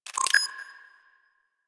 Animal Lure Call Success; Venus

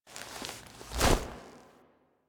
Queens Chamber Foley Queen Scepter Swipe; Warframe; The War Within

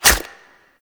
Infested Packet Claws, melee attack; Warframe; Infested Lich

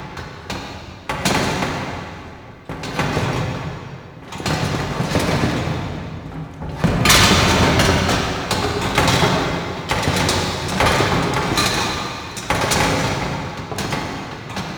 Cage Loop; Entrati Labs

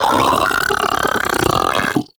Arachnoid Vocal Patrol; Warframe; Corpus; Arachnoid Hunger

